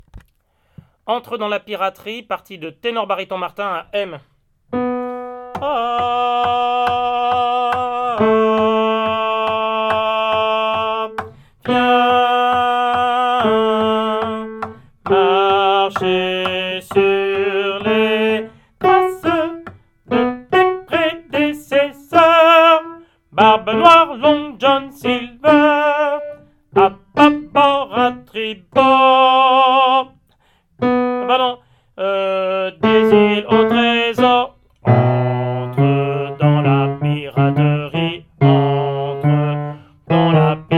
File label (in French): Tenor Bar